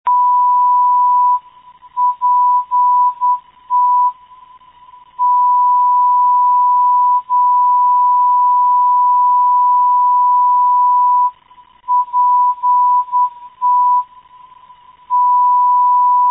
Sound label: pt 295khz, ndb